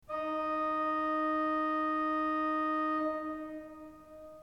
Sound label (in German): ton, orgel